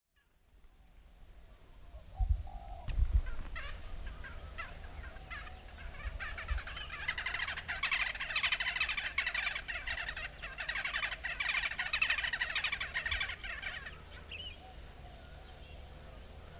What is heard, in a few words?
black headed woodpecker